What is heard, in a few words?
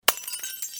BEER BOTTLE BREAK